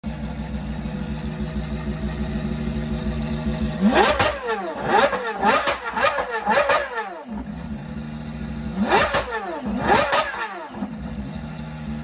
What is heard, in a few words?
Gordon Murray, اگزوز